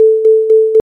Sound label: volume saw 4hz